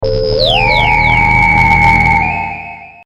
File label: Ufo, Bean, Ray, Vintage, Science Fiction; Scifi; 5.1